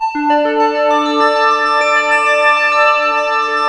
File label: ARP; Rubber Chicken Software Sample Bank